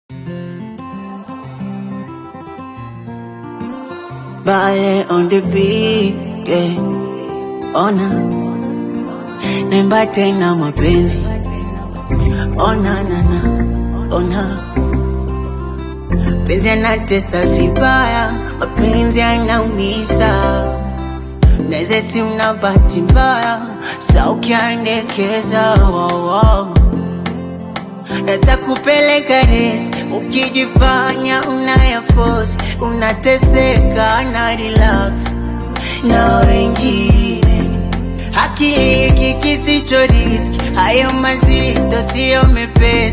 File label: duet, music tanzania afrobeats kenya